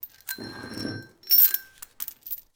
Footsteps Chain

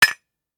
Beer mug cheers

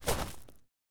footsteps gravel

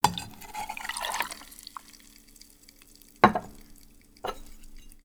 Pour the liquor into a shaker

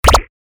move

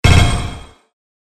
drop

